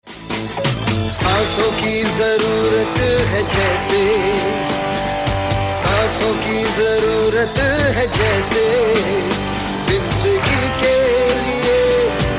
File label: Garba gujrat